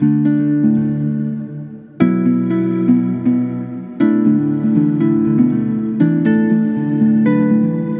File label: Musical Loop 120 F Minor; Overcast; Ghosthack